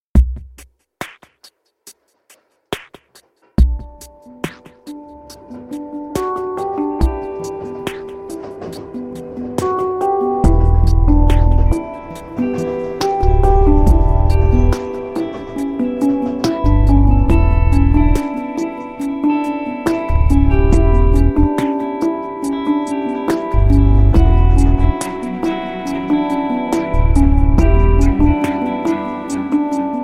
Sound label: hangdrum